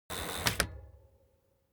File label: transportation bicycle street brake hard ring